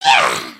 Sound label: air guitar; emotes